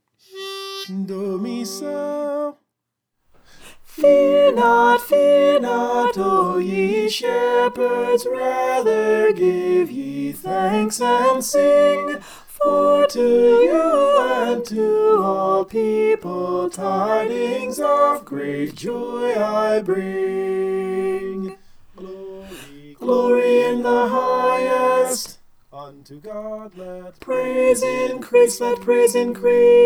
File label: soprano